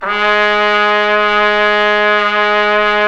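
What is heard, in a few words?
trumpet f; Roland L-CD702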